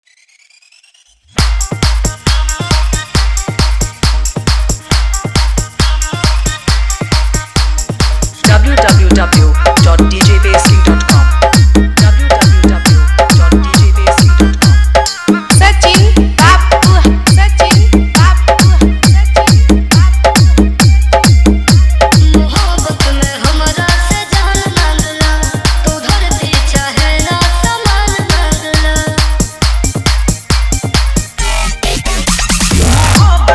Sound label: Hard Vibration Bass Mix